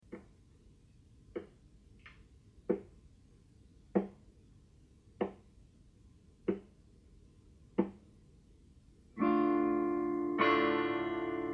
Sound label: unplugged